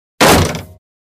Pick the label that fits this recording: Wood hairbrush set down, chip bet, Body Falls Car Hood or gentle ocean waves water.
Body Falls Car Hood